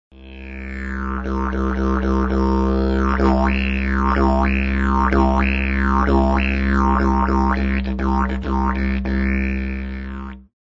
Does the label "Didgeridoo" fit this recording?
Yes